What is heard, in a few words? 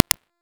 Undead Screams; Dante; Warframe